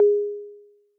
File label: Button